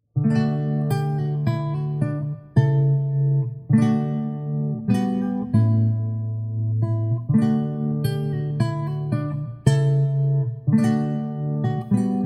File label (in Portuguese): violão, minueto ré maior; Visee